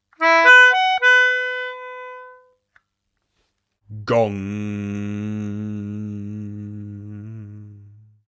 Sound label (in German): Gong